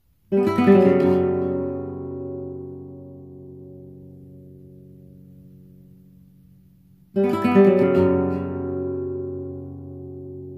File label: taranta guitar